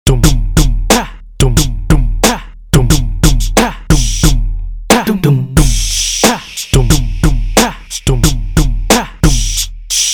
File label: TUM TUM CHAM